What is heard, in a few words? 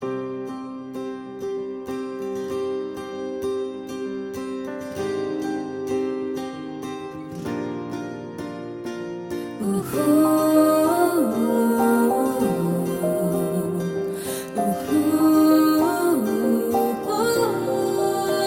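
background music; Color Photo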